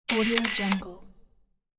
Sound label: digital camera auto focus